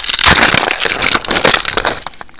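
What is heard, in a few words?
LANDSLIDE, NATURE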